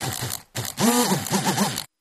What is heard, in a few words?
Motor, Small; Vibrating With Buzz, Multiple Quick Revs